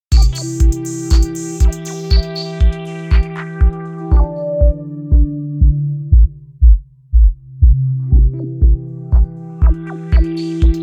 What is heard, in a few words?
1 Knob Filter